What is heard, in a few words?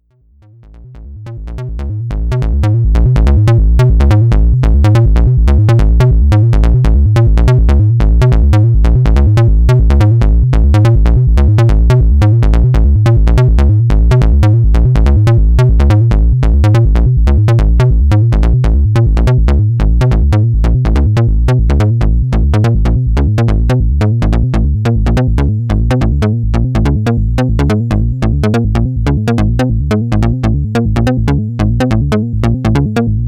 sonicsix OSC morph